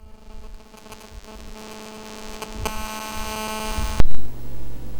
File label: Dimension Interfere